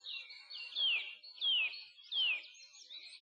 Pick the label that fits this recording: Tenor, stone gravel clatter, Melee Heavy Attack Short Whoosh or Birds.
Birds